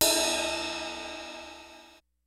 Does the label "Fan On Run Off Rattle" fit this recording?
No